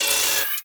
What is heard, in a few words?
Railjack Turret Harness Movement One Off G; Warframe; Vehicles